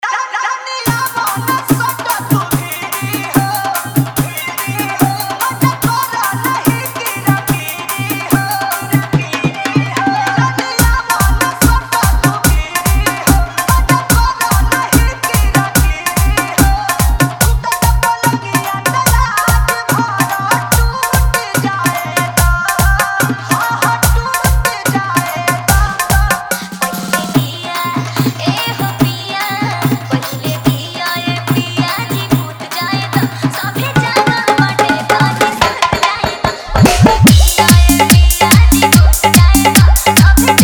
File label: Fully Dhollki Jhankar Bass Mix